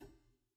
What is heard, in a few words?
Harpsichord, French, Releases, D3; Chordophones